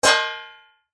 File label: impact metal tray tin hit blunt med pitched resonate ring